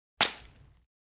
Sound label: impact juicy; fights bodyfalls; Asian Show Sound Effects